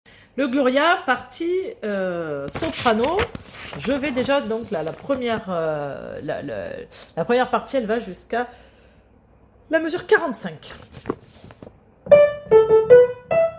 soprano

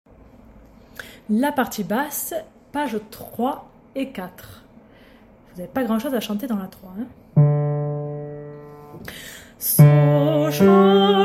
basse; Suite psaume 42

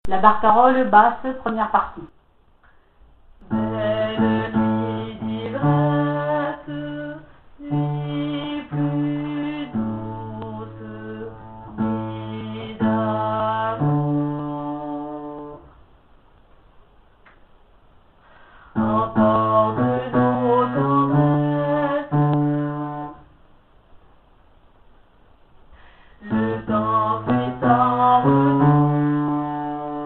Basse; Barcarolle; Offenbach

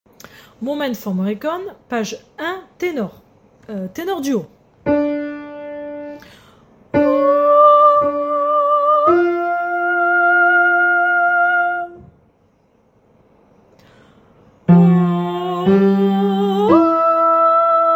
Tenor du haut

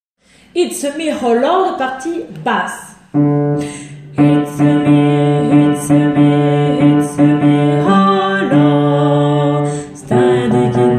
Basse

